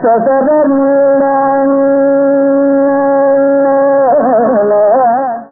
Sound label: Kambhodhi Shruti C